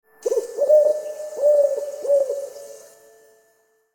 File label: Night sounds owl